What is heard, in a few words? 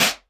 Elektron Monomachine, Drum Machines